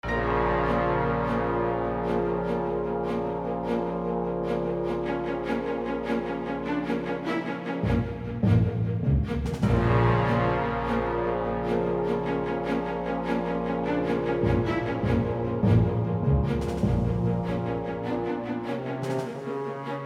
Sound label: D-Day Briefing Music